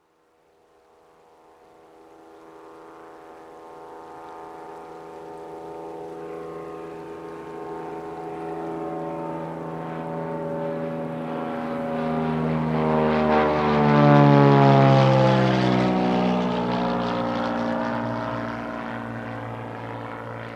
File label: Plane Flyby